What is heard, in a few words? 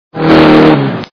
pass Sound; extremeracing2